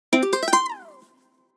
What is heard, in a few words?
transition